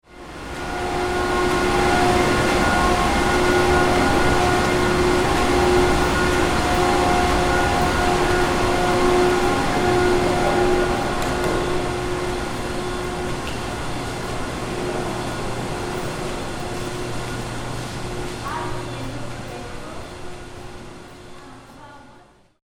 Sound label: warehouse ambiance